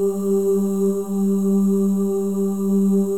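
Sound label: OH, female OHS, Voices; Spectrasonics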